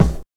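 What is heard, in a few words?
bass drum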